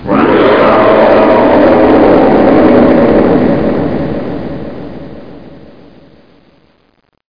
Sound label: howl